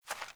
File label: Beaver Dig, Foley; Animals; Ambient